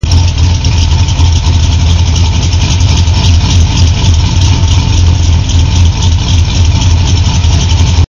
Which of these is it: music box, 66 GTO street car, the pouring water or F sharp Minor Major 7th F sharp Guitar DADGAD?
66 GTO street car